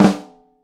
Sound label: full snare sound g key; snare drum samples